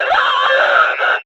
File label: Enemy Death; Warframe; Lasrian; Battle Barks